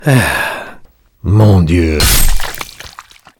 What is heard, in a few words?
death; the thumb